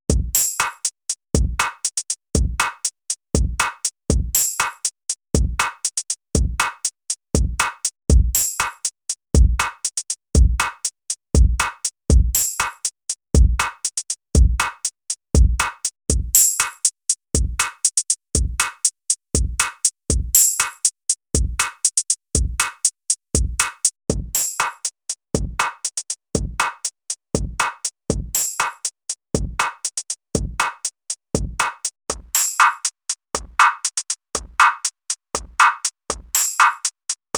THREE BAND PARAMETRIC EQ Drumloop; CHERRY AUDIO RACKMODE

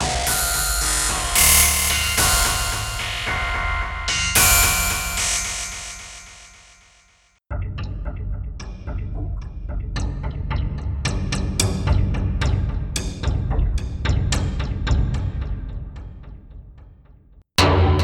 Perc Arpeggiated; CinemorphX Presets SC

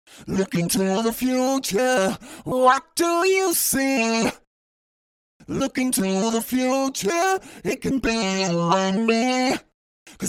Autotune Throat Duet Tube Vocoder; Antares

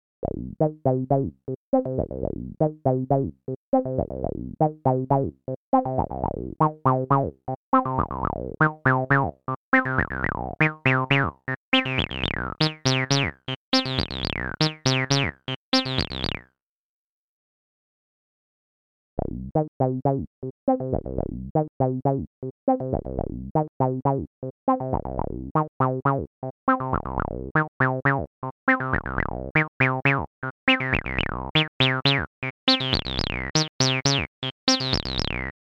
SAW; ABL2 versus ABL3

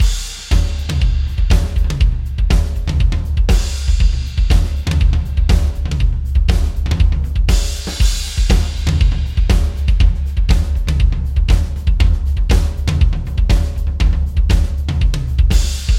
subFilter WET

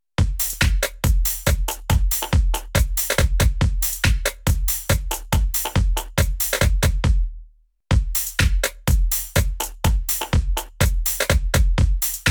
Dance Hall to House; UJAM; Beatmaker; VOID

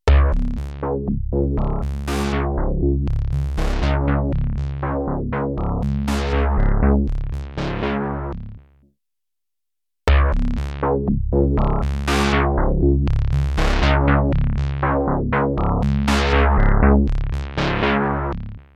Synthbass; ARTURIA PRE

